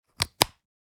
Speaker connector adapter plug in; headphones and speakers

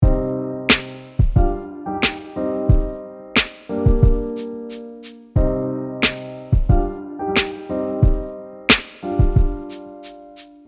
Old school rap drum and piano

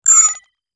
Futuristic digital switch turn on

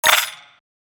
Digital system error

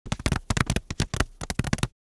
Cartoon pop corn

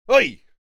Male shout for attention; scream; scream sound effects; gfxsounds

